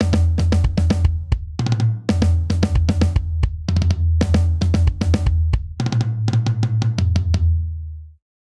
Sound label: Polyrhythmic Dance Outro 114 bpm, Drum; MIDI Clips; Ableton; Factory Packs; Drum Booth